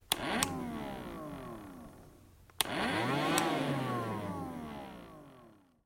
zvuki ruchnogo pylesosa

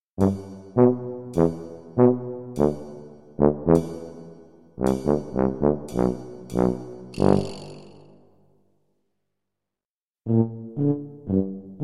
zvuki tuby

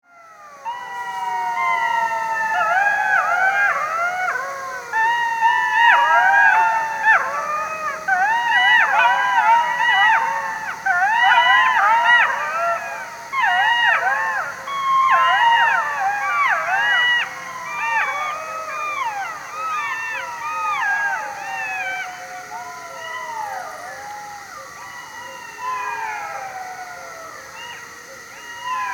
zvuki shakala

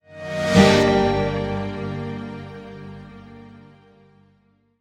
zvuki s effektom zaversheniia, okonchaniia

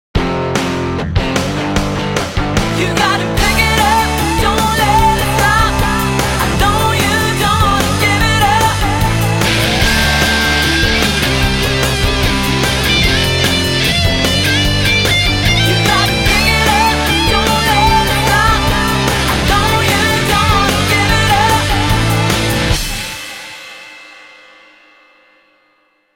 originalnye ringtony nokia 5310 xpressmusic

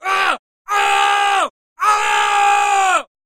zvuki s krikom goriashchego cheloveka

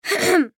zvuki pershenie v gorle kkhm

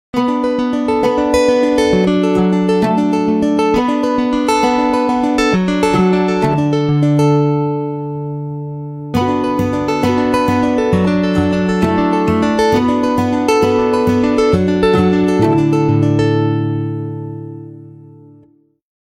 originalnye ringtony i uvedomleniia nokia 808

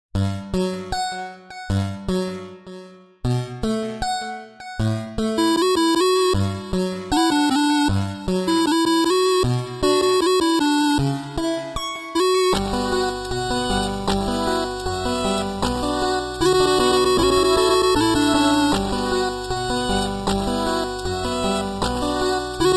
8-bit muzyka na sotovyi telefon ringtony

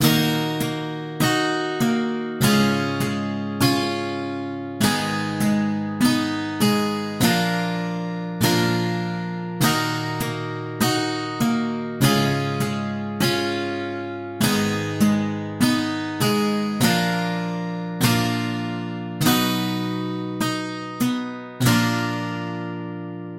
zatsiklennye melodii dlia gitary loop

